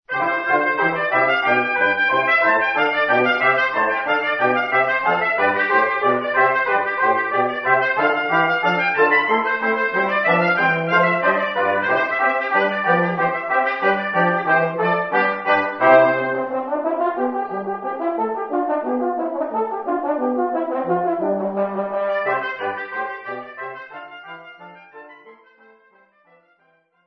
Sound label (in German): Hornkonzert; Telemann